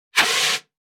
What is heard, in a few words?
Fire extinguisher spray short